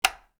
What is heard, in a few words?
switch